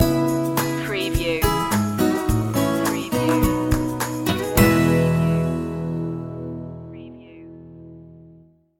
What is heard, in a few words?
warm like sunlight stinger, A peaceful, kind and positive acoustic music track; country-folk